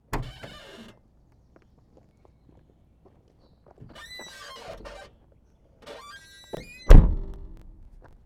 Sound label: Trunk, Car Doors; FXHome